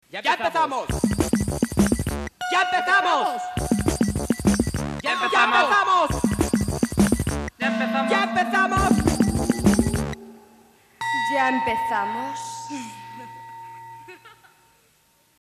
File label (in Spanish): CORTINILLA; DIARIO POP RNE; Cortinilla 6 emitida